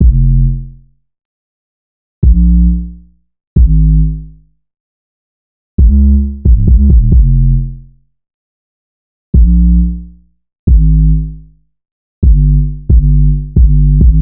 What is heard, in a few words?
Synth loop 135 Fm; Synth Bass Loops; Slate Digital BASSHOLE Sample Pack